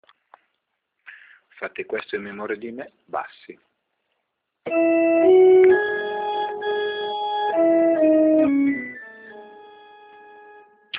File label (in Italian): bassi base musicale